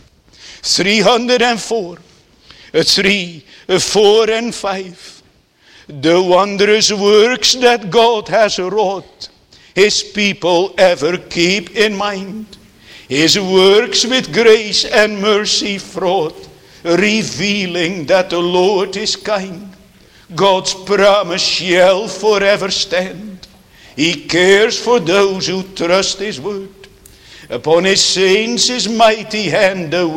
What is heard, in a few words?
Singing; The Daughters of Zelophehad (2)